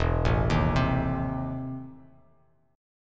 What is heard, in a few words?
E Major 7th Suspended 4th Sharp 5th, Bass Standard; EM7sus4#5 arpeggiated